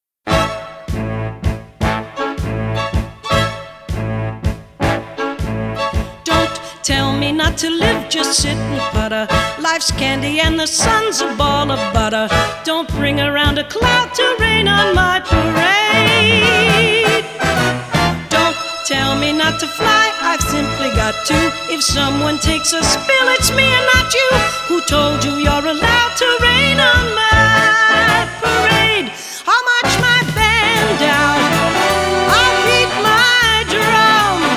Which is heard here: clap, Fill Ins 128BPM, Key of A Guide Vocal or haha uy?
Key of A Guide Vocal